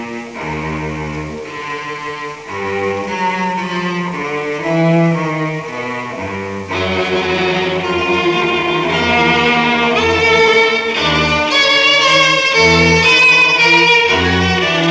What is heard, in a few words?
suspense